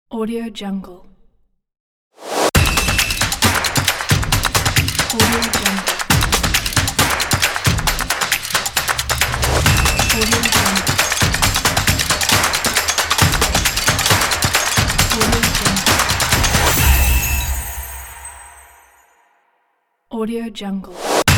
upbeat metallic percussion logo

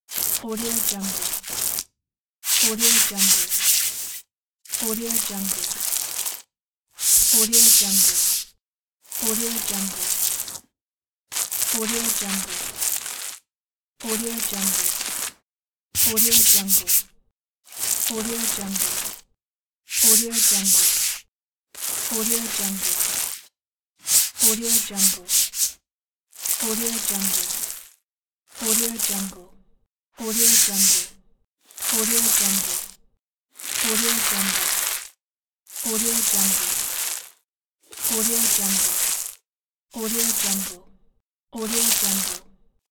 paint transition sound effects pack

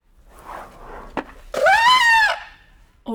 asian elephant; prosoundfx

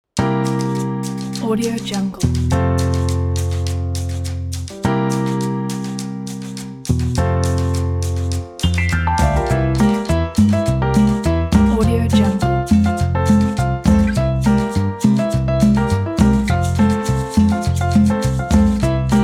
the latin cumbia

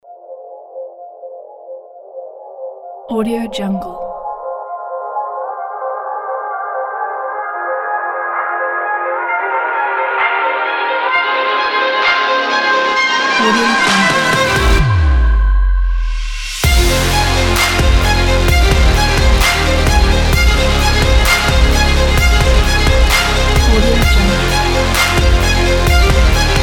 dramatic sport epic rock trailer

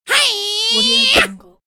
cartoon karate yell whoosh chop

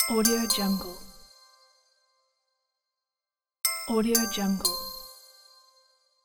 bell

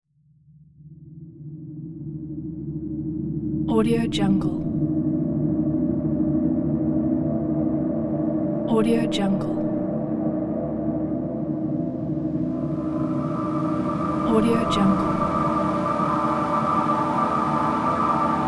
alien wind

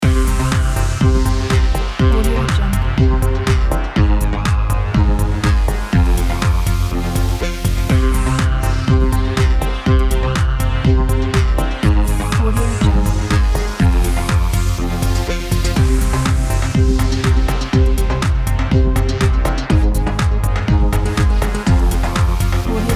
powerful sport loop

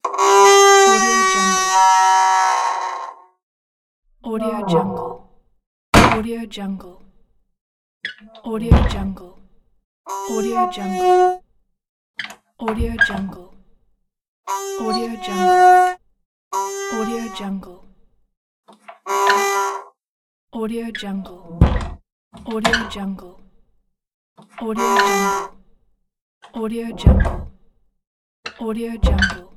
door sounds and noises

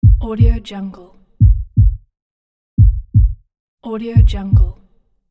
slow heartbeat pulsation

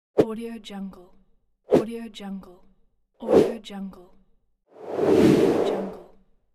whoosh

